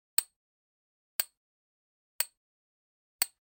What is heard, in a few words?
Generic Click Glass Tiny